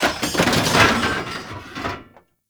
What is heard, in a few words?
Desktop Metal Destroy; Ambience; Gameplay; Warframe; Entrati